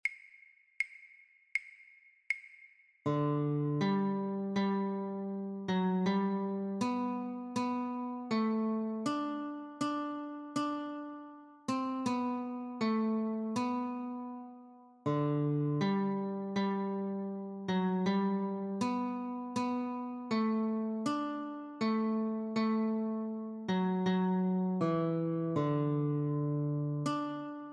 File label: Notes, guitar; Hark! The Herald Angels Sing; christmas